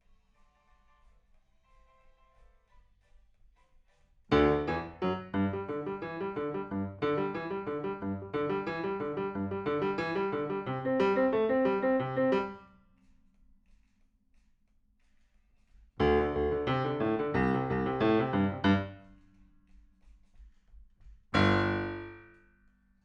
Piano, RNR for piano

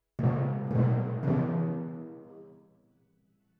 Timp

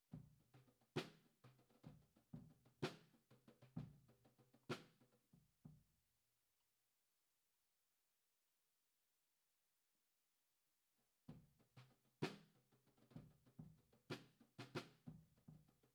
hi hat, 96bpm

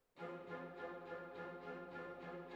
Hall, Jazz for piano

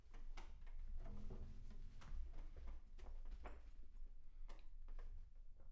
Bass Room

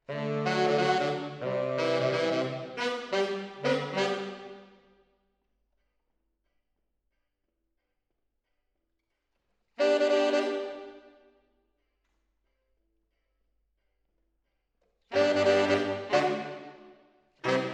MKH AB, piano

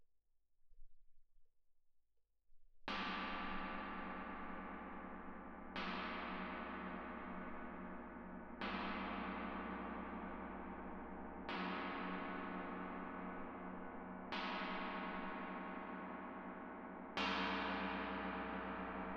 Tam-Tam Main

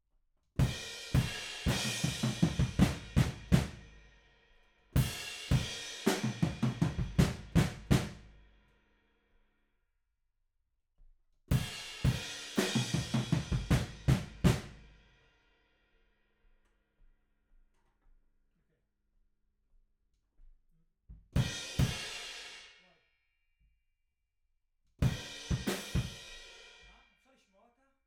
Room

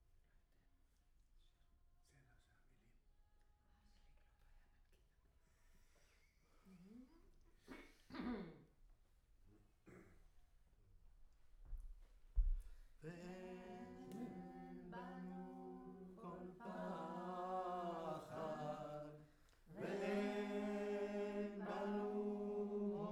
Room, Choir

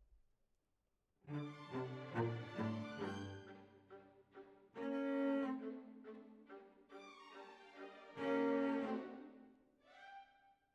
Cellos

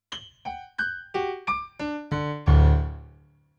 PIANO, RNR for piano

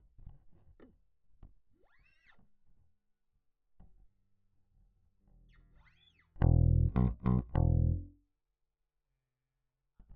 Bass

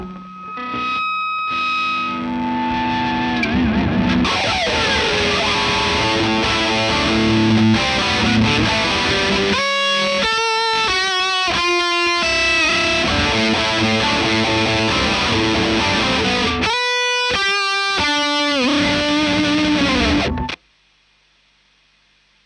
Marshal Gtr Line

